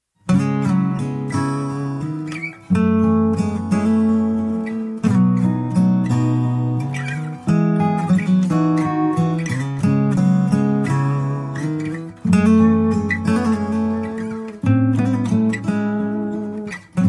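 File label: acoustic version background music ringtone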